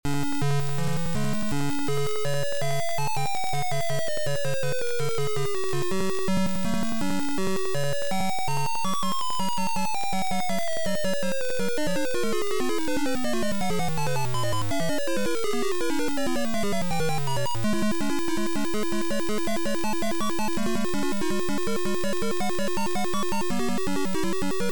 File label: Title Beeper; Stifflip & Co